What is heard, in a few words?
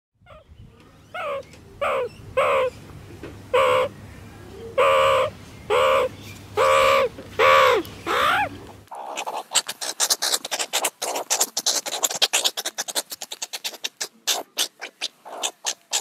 Meerkat Sounds; mirket; Learn The Sound A Meerkat Makes; Animal Sounds